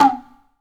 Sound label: KOTSUZU, tuzumi; AKAI S-Series CD-ROM Sound Library VOL-5